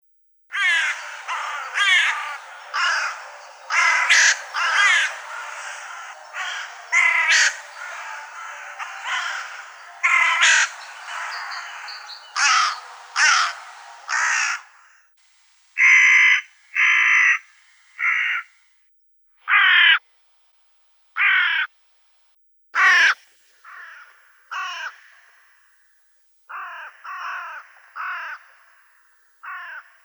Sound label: Corvus cornix